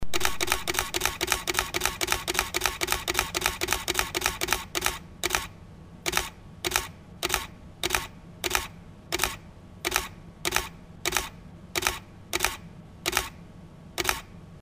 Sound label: canon eos 5d mk ii